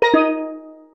jingles steel, Steeldrum; Kenney; Music Jingles

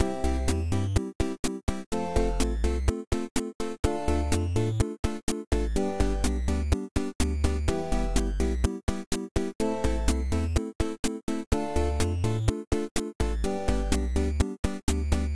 Retro Beat, Music Loops; Kenney

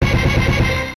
jingles hit; Music Jingles; Kenney

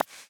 Sticker Place; StickerClone

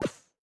Sticker Done; StickerClone

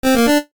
Music Jingles, retro; Kenney

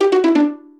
jingles pizzicato; Kenney; Music Jingles

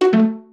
jingles pizzicato; kenney; Music Jingles

